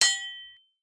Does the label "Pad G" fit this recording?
No